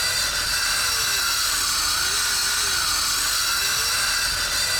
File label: mecha dash